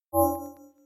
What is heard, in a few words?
Hi-tech open Mi MIX3